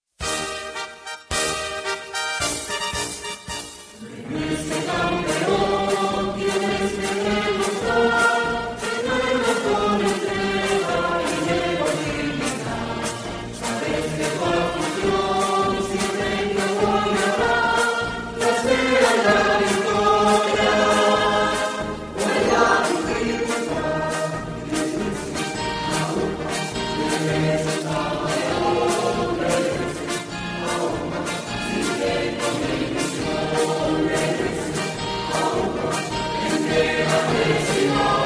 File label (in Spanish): Himno - Voces; SD Lenense; Voces de Lena